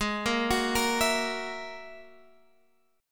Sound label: G sharp 7th Suspended 2nd Sharp 5th, Guitar, Open G; down; chord shape